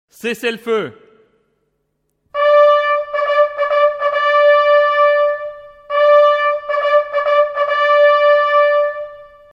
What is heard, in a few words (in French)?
cessez le feu clairon